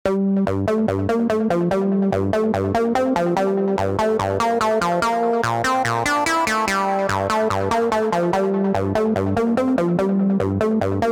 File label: Lead Dorian G, goa trance midi